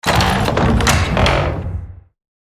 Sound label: wood door open